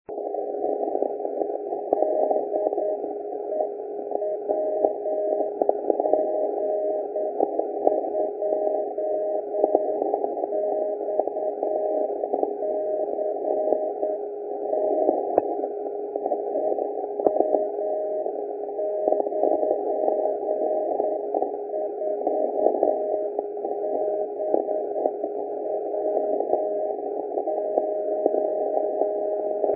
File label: CW Beacon 471.9 kHz